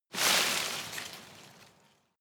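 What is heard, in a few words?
tree small leaves hit, garten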